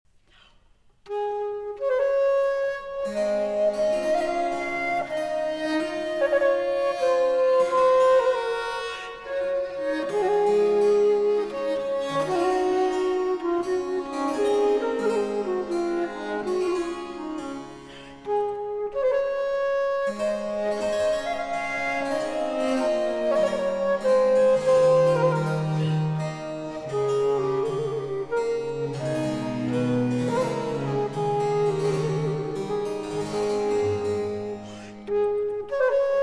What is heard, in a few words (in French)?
Pièces de clavecin, en ré majeur; F. Couperin; Livre III 14e ordre; I. Le rossignol en amour